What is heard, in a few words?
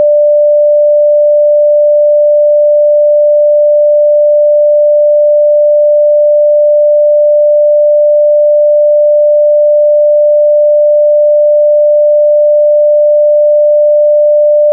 591 SINE; SINE WAVEFORMS